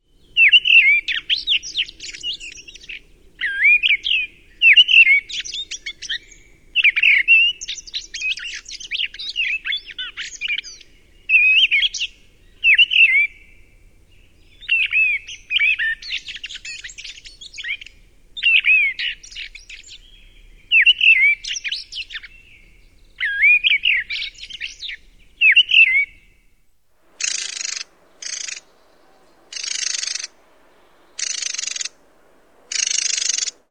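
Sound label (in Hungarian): Léprigó, Madarak; mono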